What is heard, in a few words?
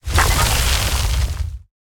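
projectile; Smite; Vulcan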